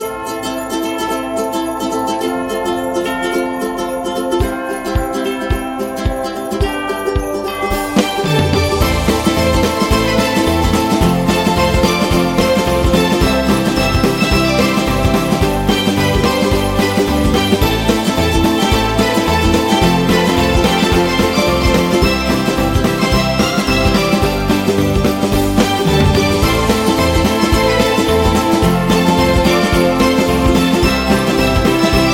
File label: Upbeat Christmas Music; xmas